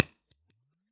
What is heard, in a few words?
Science 6x10 SC10 MD421 3in, Bass Cab IRs